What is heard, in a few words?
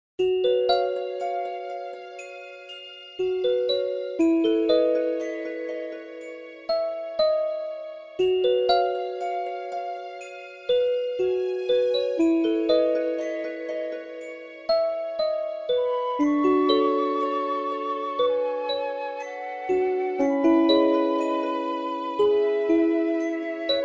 Chat Room; Hello Kitty Online; Floropolis